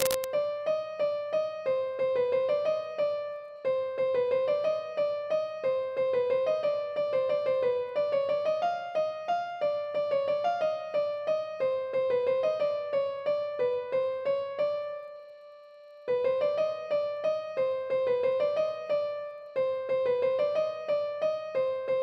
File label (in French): Valse pour Noel